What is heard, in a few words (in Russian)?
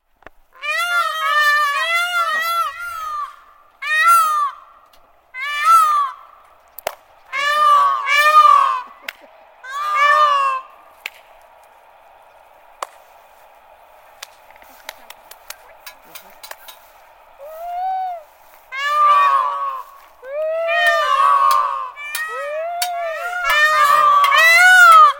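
pavlin golos, peacock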